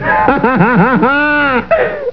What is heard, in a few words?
laugh